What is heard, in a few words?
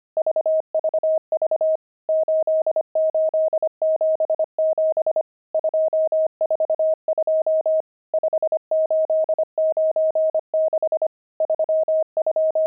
pangram, intermediate pangrams files